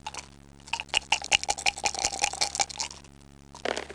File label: dice; WAV Sound Effects; parks